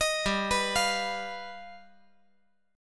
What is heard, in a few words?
G Augmented 7th